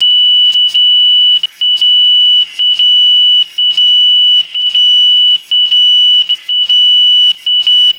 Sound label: constant tonal electronic alarm beeps; alarms